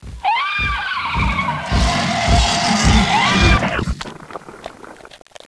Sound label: DIV CRASH; DINOSAUR